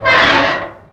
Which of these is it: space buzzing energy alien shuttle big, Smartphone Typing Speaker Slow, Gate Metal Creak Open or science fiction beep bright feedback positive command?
Gate Metal Creak Open